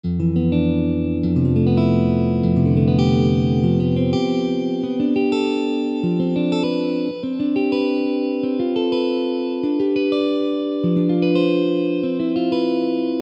F major 7th sharp 11

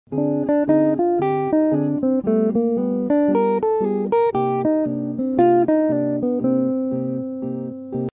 minor 2 5 1

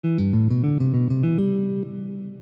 C Major Phrase

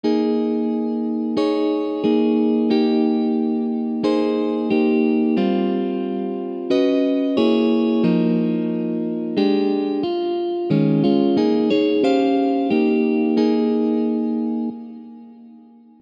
small chord

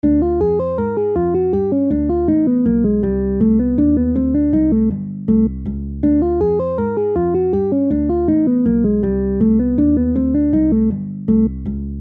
minor 2 5 1

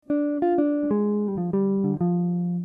blues

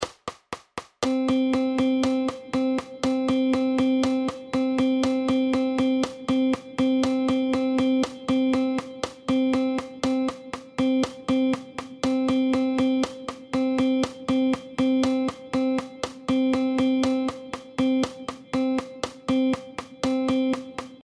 rhythm

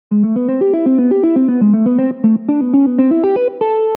approach

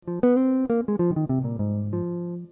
blues

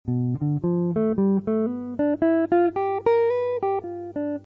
g7 phrase

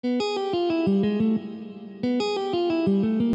major 2 5 1

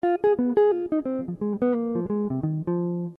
blues

